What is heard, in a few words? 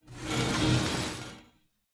recall drawline metal; Smite; Cabrakan